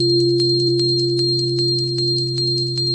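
151 bpm tinnitus for everyone, drum loops and breaks; 141 to 160 bpm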